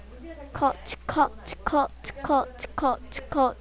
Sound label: cough